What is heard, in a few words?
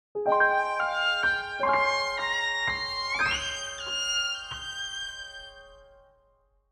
Video game success victory win gentle